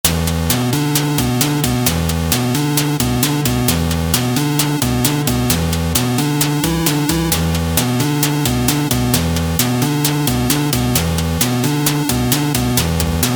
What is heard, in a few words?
8 bit